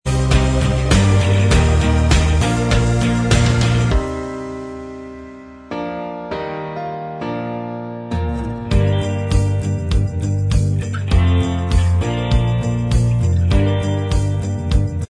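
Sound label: instrumental track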